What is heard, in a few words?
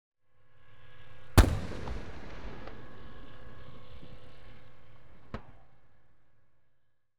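Warfare, Scorpion Firing Hesh Rounds; BBC Sound Effects Library; Explosions Guns Alarms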